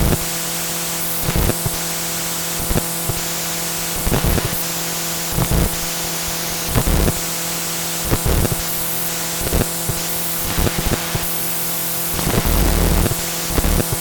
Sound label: fail preamp test; livery lasercut covers